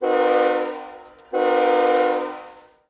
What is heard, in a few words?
train horn distant; ambient